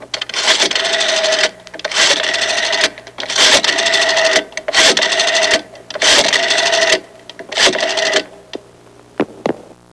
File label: rotary dialing 6 digits